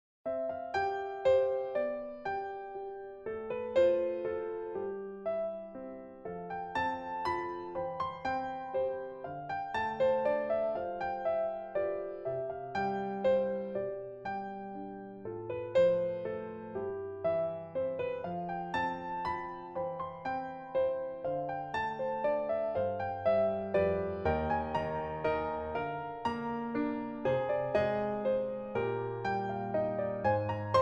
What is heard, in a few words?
Music Only